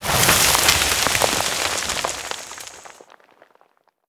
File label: ceiling debris